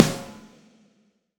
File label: Snare